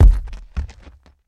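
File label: Rock Dirt Heavy Dead Thud; rocks & dirt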